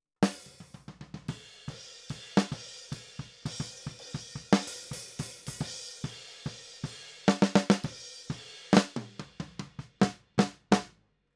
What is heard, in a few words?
Snare